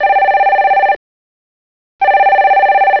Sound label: telephone